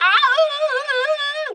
Aaawooooo Wet; ROLF; Ed Edd n Eddy; Adromulus Pack; EEnE

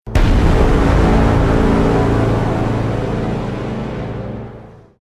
BWAAAANG

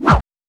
Whoosh; Ed Edd n Eddy; Adromulus Pack; EEnE

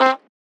Honk; Ed Edd n Eddy; Adromulus Pack; EEnE

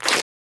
Rip; Ed Edd n Eddy; Adromulus Pack; EEnE

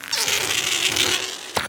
Stretch & Pop; Ed Edd n Eddy; Adromulus Pack

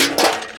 Metal - Falling Over; Ed Edd n Eddy; Adromulus Pack; EEnE

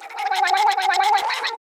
Mouth - Noise end; Ed Edd n Eddy; Adromulus Pack

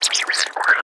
Mouth - Noise; Ed Edd n Eddy; Adromulus Pack

